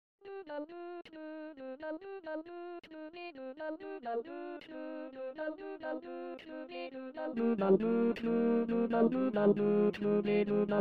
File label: Tenor SATB